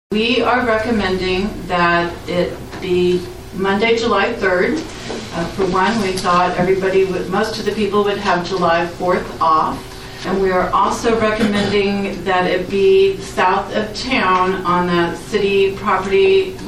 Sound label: Fireworks